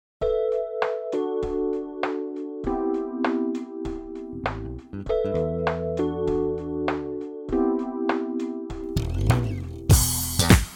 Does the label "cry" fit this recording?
No